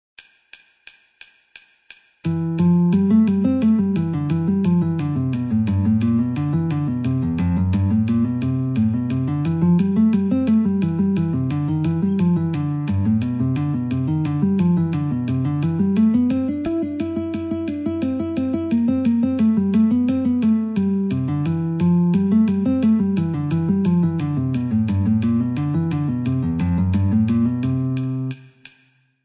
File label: Wild Flowers Guitar, metronome 88